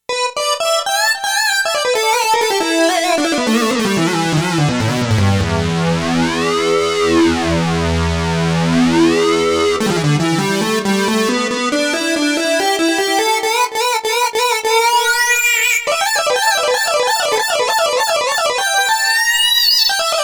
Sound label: SYN LEAD 2, Roland JX-1; Factory presets